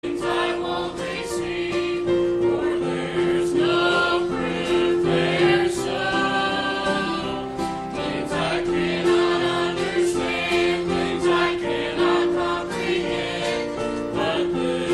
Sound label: Youth Choir